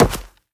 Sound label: step run, footsteps, player, carpet